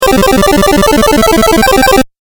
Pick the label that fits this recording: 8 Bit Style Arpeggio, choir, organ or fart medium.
8 Bit Style Arpeggio